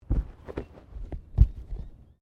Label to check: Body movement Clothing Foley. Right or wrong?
Right